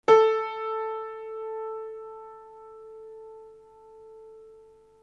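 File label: forte organ; synteza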